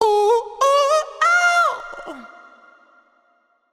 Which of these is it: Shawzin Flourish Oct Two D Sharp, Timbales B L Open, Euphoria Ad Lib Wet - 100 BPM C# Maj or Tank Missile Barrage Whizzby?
Euphoria Ad Lib Wet - 100 BPM C# Maj